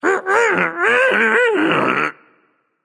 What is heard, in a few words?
tongue, voicepack; smite; jorm